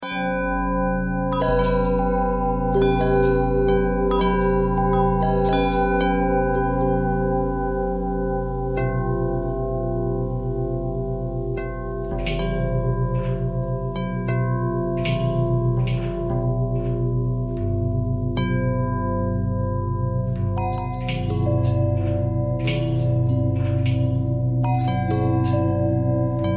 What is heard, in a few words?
long bell bell thread simple, bells